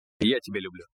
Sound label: sms